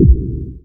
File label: BD, Dance Mega Drums; Best Service